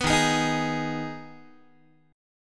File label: D sharp Suspended 4th Sharp 5th, Guitar; strummed; JGuitar